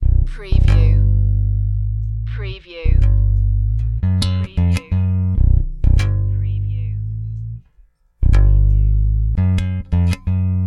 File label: Hip Hop Bass Guitar Loops 90 BPM - B